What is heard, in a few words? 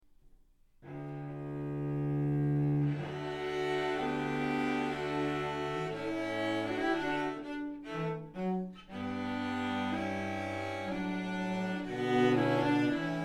Cello Choir Etude